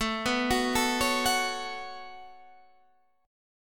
C sharp 7th Suspended 4th Sharp 5th, A, guitar chord